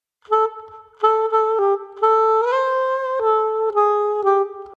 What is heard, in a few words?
sax